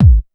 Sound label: dance